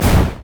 mage chains; LoL; ironforge xerath

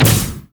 light strike; LoL; lux

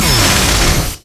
death ray; LoL; viktor skin03

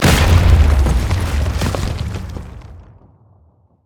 turret break; order turret

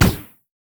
turret; LoL; heimerdinger; uranium

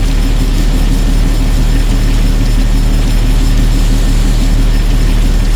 shield; rumble skin03

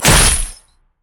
bola strike; LoL; hunter rengar

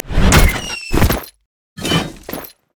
respawn; LoL; jinx base

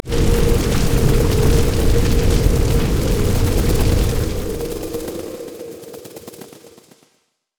torment; LoL; swain

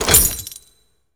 ui store buy; LoL; hud

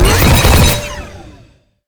carpetbomb; rumble skin03

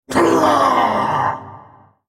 ultimate effort; HecarimArcade; Character Voices - English

